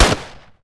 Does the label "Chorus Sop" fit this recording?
No